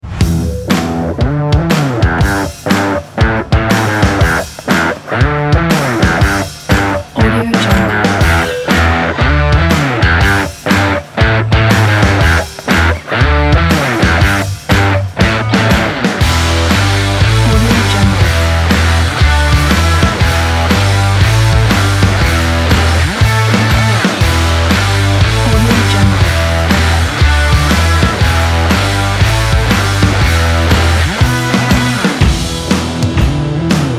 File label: rock drive